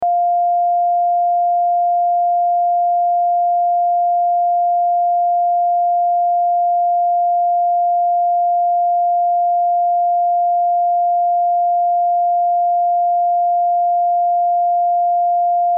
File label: Test Tone Sine; Test Tone Sine Wave 700Hz 60 Sec -12Db Loop